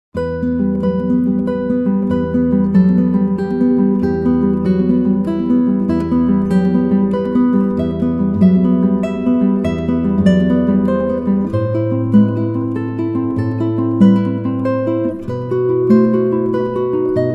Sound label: spanish, classical guitar